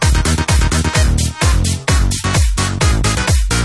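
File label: sms style electro house; stil electro house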